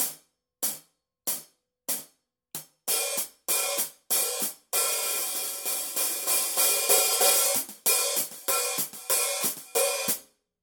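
signature precision 14 heavy hi-hat pattern, cymbales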